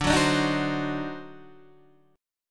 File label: D 7th Flat 9th D down Guitar Open D